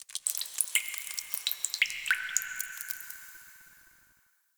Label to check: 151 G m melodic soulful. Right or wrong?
Wrong